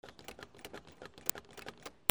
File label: MONEY